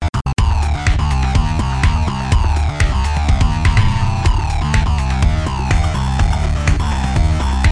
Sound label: Result Music; cartoon network punch time explosion xl 2011 gamerip